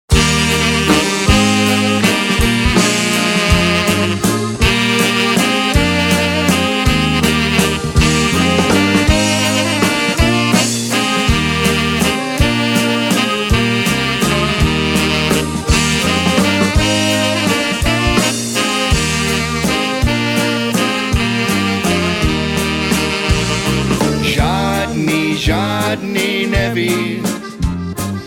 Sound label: Waltz